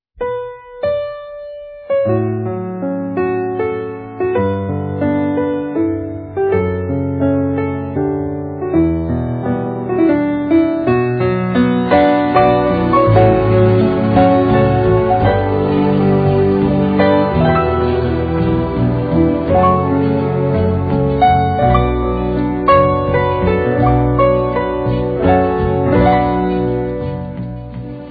Waltz, 28 bpm

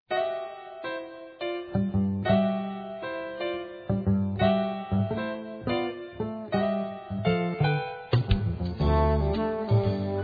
29 bpm

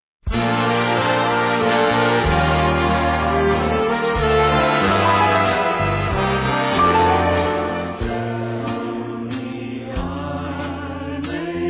Waltz, 30 bpm

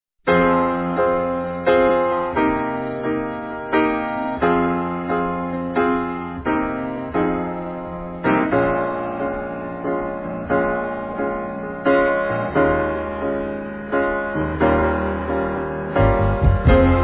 Waltz, 29 bpm

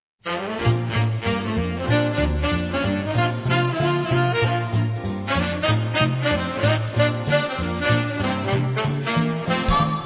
49 bpm

